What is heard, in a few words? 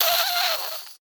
Cartoon Human Male Baby Monster Hiss; AdobeCC